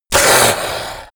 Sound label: yeti hiss savage; kreaturen